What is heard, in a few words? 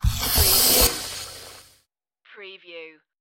sci-fi door movement